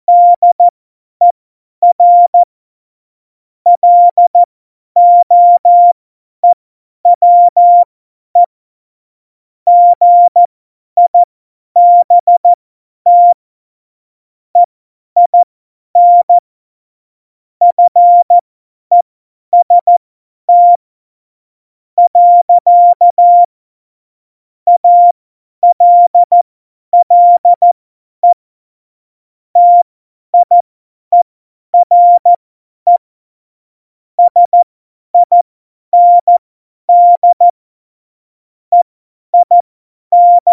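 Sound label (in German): Witz, cw morsen; Morsekurs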